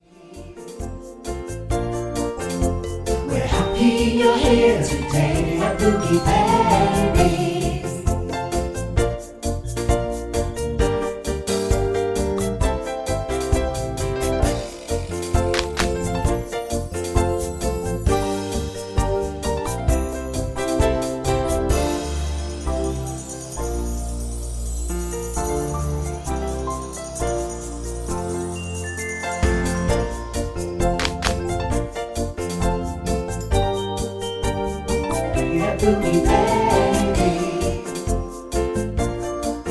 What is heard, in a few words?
Good Morning Song